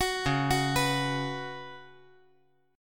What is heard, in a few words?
C sharp 7th Suspended 4th C sharp